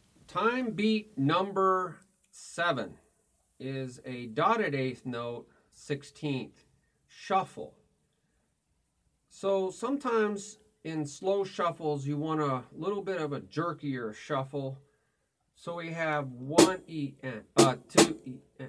Time 1 a 2 a 3 a 4 a Toom Ti Cha Ti Toom Ti Cha Ti